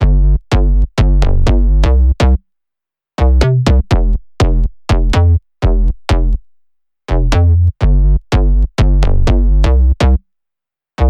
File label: BASS